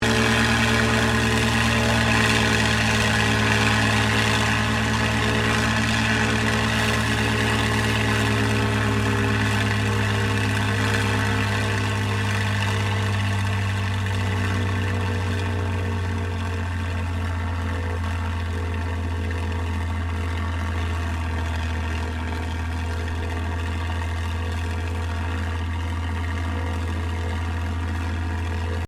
AIRCRAFT CAC CA-18 MUSTANG MK23 OPERATING DECCELERATING STEREO; CA-18 /P-51 Mustang; Airplanes; Sound Effects:Vehicles